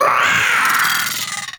Amalgam Moa Vocal Attack

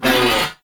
Ram Pain; Animals; Duviri

